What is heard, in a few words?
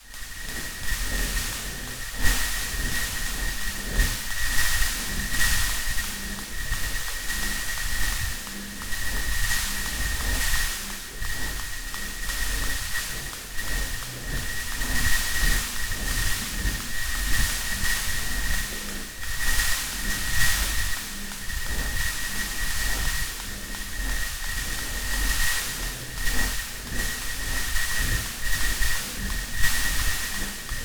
Cm72 Zone Ambience